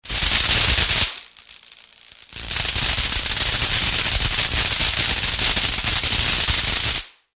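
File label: sounds of welding machine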